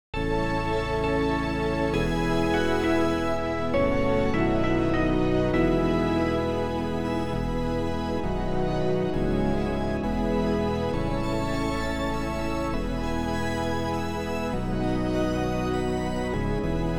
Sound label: backing; New Hope Music